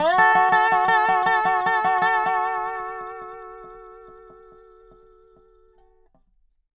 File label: DOB LOOP, Country; Whole Lotta Country